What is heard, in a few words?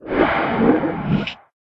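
necromancer dodge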